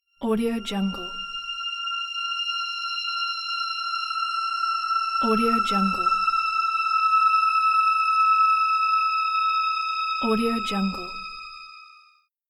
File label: dark tone